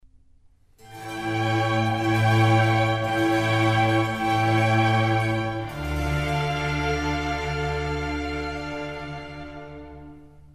Concerto for Strings in C major; RV110; Antonio Vivaldi

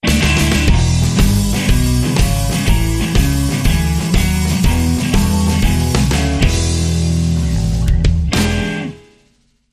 Blues

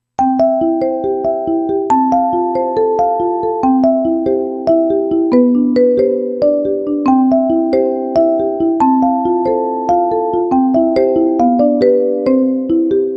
Tinkle Bell

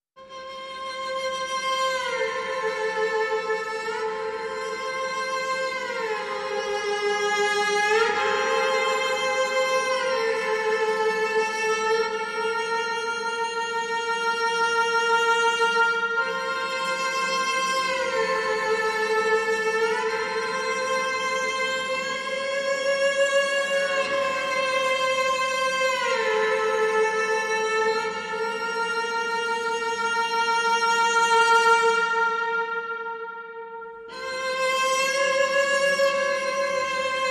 Lead Cello

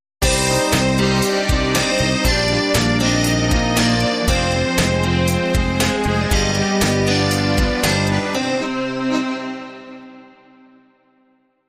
Accordion Song

